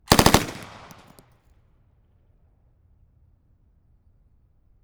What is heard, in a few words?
M1928A1 Thompson Submachine Gun .45cal 0.3m to side Burst of four; ORTF